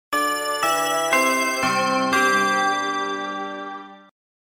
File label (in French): SONNERIE; pySonnerie